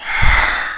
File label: pain; Alien Arena; xenoid